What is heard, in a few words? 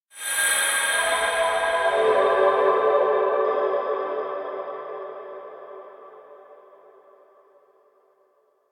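electronic lookup crystal mountain; anemoi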